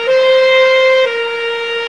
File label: city euro siren loop